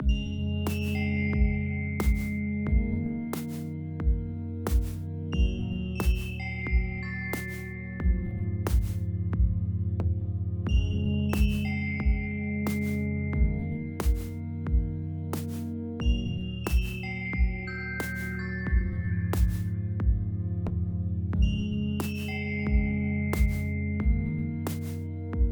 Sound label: slow weighty loop